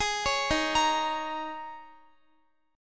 Eb 7th Suspended 4th Eb, Guitar; Eb7sus4; arpeggiated

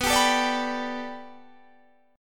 B Minor 7th Sharp 5th B, chord

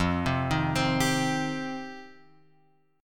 F 7th Suspended 2nd; F7sus2 Chord {1 3 1 X 1 3} arpeggiated

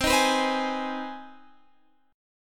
C Minor Major 7th Sharp 5th, Guitar, Standard; strummed; JGuitar

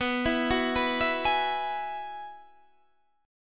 Ab Minor 7th Sharp 5th

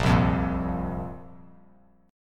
A sharp Suspended 2nd down; strummed; A#sus2; JGuitar

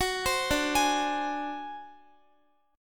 D 7th Flat 5th, Guitar; arpeggiated; x,x,16,17,14,15; 4E,4A,5D,5G,5C,6F; chord

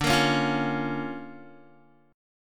D Minor Major 7th Sharp 5th D Guitar; strummed; 10,13,11,10,13,x; 4E,4A,5D,5G,5C,6F

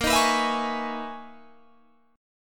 B Major 13th Bb, guitar

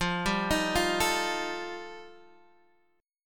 G 7th Suspended 2nd Sharp 5th F chord

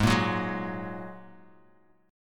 Ab 7th Suspended 2nd Ab; Ab7sus2 strummed; 16,13,16,13

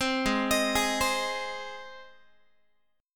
Ab Major Ab down Guitar; 15,16,18,18,16,x; Ab arpeggiated

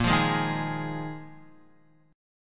Bb 7th Suspended 4th Bb Guitar 4E,4A,5D,5G,5C,6F; strummed; JGuitar

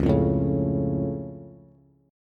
Db Augmented, down, Bass; strummed; JGuitar

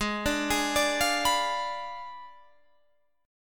G sharp Diminished 7th G sharp, Guitar Standard; G#dim7 arpeggiated; JGuitar

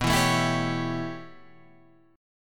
A 7th B, chord; A7/B; strummed; JGuitar

